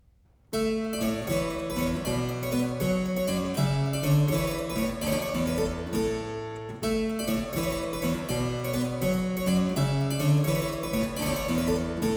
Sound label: Canary, harpsichord; Complete Chamber Music; Brilliant Classics; Miscellaneous pieces for the harpsichord